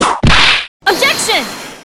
whip objection; franziska young; custom objections

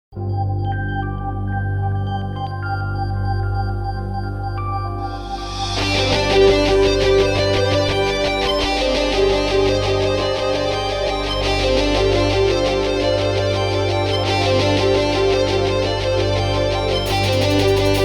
battle (moderato)

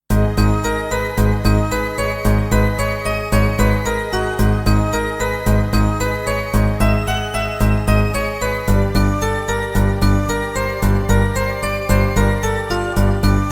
questioning (moderato)

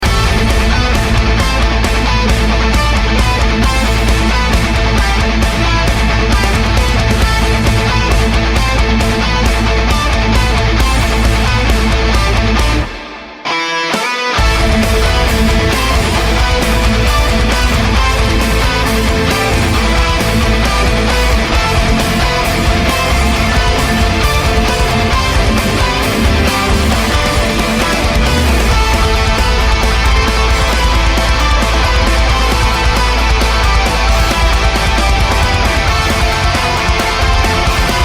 questioning (allegro) (metal), music

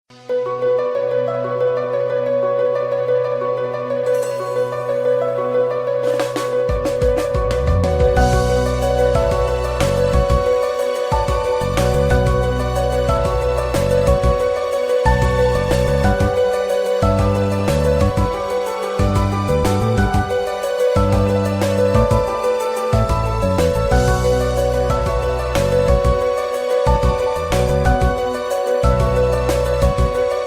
questioning (allegro)

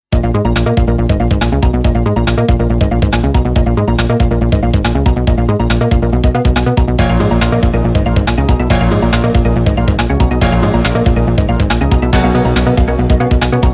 questioning (allegro)

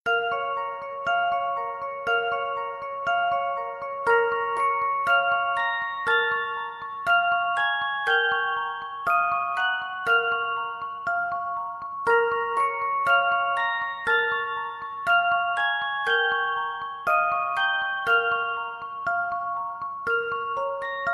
questioning (moderato)

